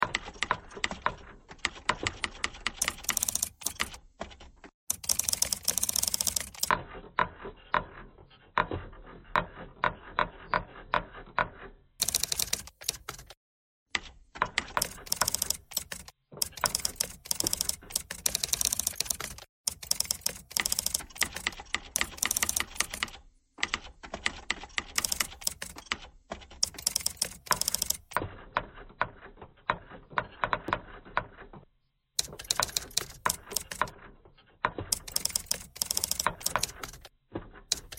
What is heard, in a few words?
typewriter thread simple